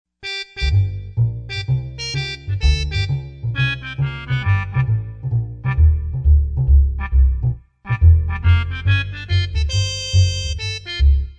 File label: Yamaha TG55 Jazz Man; Factory Presets